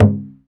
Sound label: Ugandan Drum; Roland LCDP14 Africa VOL-2; Af. Hand Drm